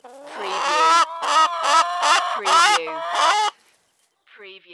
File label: Chickens Squawk